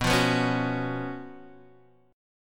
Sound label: F sharp Major 7th Suspended 4th, B, chord